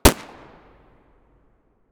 glock17 muzzle mono far

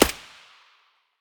aug silencer distant; STALKER; IWP

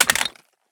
pistol drop tile; STALKER; IWP

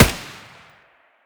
scar muzzle mono distant

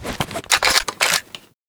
rg6 reload, weapons

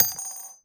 Shell heavy default; STALKER; IWP

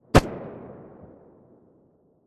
glock17 silencer mono distant

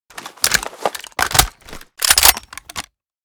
fiveseven reload empty, weapons

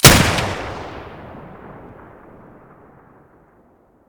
muzzle beneli stereo, weapons; m1014

